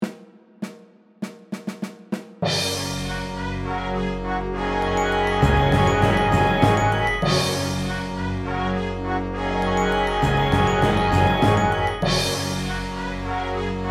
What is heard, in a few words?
F, Backing